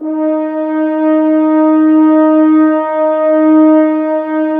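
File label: Brass, French Horns; Sample CDs; Miroslav Vitous; Woodwind Brass Ensemble; F.Hrns Links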